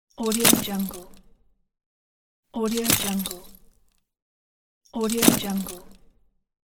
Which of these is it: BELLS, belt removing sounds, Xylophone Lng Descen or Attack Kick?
belt removing sounds